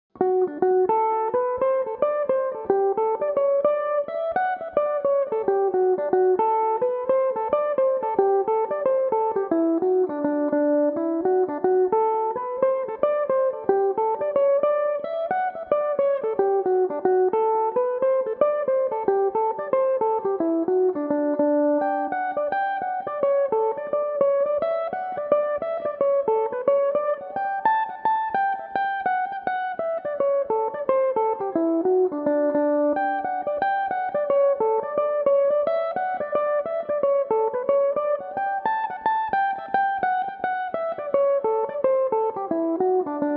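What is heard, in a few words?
jig D mixolydian, Irish mandolin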